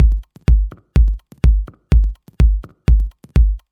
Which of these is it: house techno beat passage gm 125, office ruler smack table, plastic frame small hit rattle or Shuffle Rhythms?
house techno beat passage gm 125